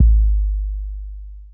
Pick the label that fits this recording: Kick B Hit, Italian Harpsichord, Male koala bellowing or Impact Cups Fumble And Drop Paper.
Kick B Hit